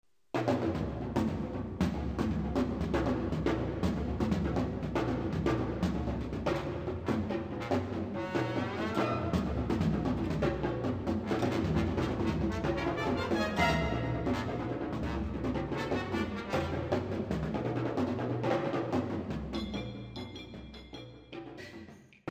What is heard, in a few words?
percussive